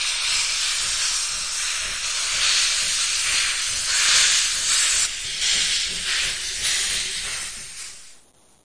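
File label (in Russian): где-то сочится струя пара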